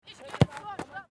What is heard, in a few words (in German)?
Fussball Jungendtraining Spielszene